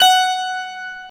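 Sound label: Harpsichord; CMI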